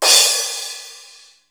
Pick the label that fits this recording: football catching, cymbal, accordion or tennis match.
cymbal